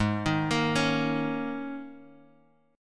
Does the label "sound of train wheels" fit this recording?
No